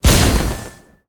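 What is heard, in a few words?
Mech Gorilla explosion; monster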